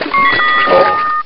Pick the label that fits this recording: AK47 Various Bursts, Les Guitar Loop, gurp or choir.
gurp